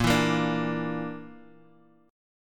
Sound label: Bb Major Flat 5th, Guitar, Drop D; 8,7,8,7,x,x